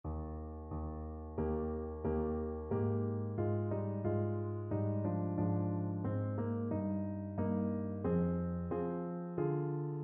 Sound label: ASAX, saxophone